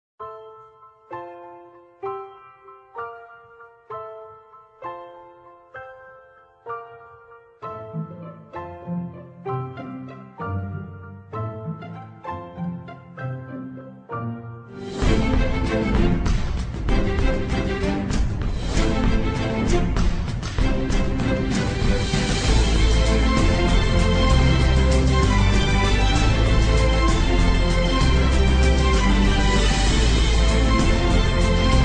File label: pirates viola